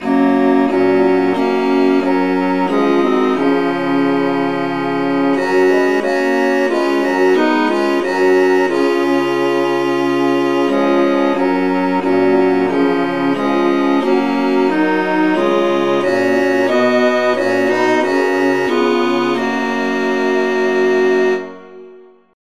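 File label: traditional key G flat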